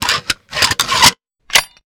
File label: antitank reload; Stereo; Weapons; AntiTank Gun; Real Guns Sound Pack 2